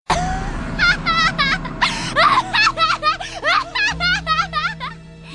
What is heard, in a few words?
Laughter